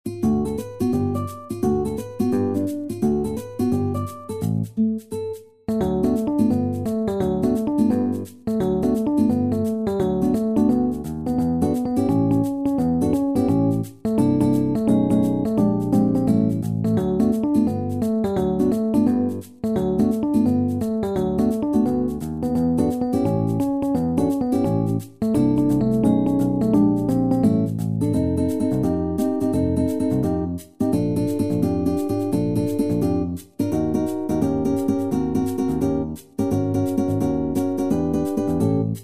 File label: midi